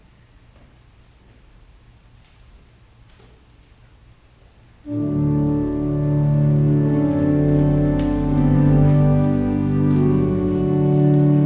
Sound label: Organ Music; isaiah 25 9